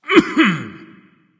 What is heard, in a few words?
clearthroat; cuchulainn